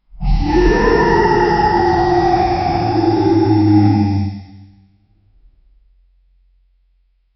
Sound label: troll roar